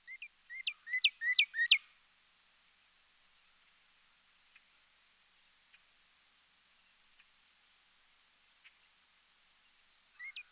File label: Cardinal purdy